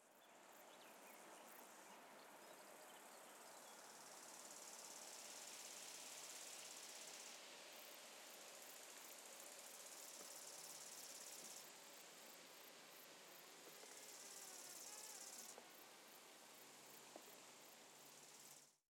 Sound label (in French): Bord De Route Allemagne Vent Brindilles Tape Micro Moustique